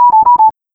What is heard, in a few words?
alarm; OVR AdvancedSettings